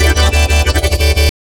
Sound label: colorsitos 180bpm; random and out of place